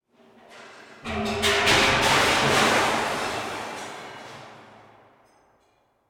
buildings rumble elevator shaft glass break further; fvtt sonniss gdc audio